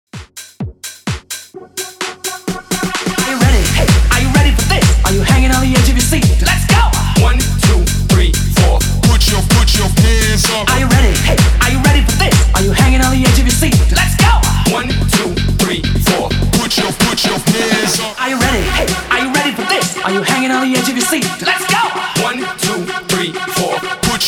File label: HYPE 128 BPM